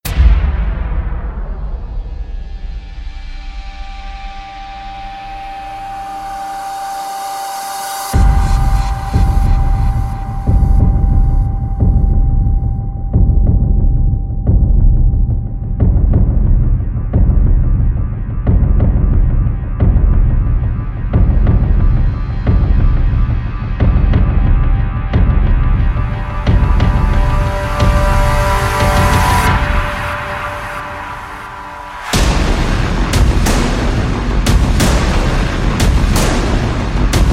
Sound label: perc mix